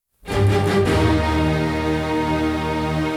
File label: Beethoven; Rate 16; quantized